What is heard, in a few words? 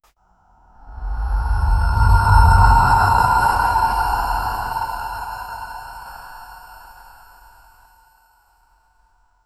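begin with an eerie silence, unsettling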